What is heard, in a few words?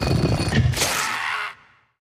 bonus Hit; netent; bloodsuckers